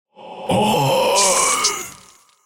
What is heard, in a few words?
Rogue Wave Death